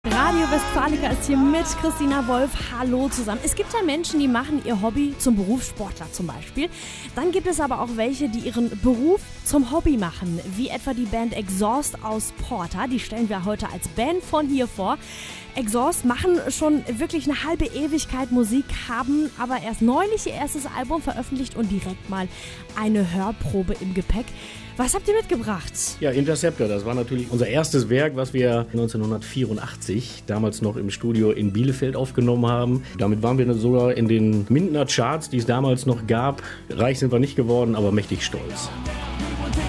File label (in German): Mitschnitt, rock